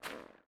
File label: Step Snow; MiSide